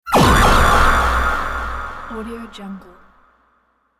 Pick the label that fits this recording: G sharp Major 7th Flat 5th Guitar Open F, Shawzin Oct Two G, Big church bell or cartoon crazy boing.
cartoon crazy boing